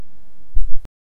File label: character dodge sound